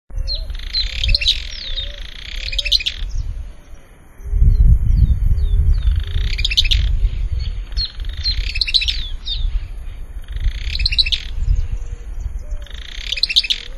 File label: Euscarthmus meloryphus; Perdices; Jorge La Grotteria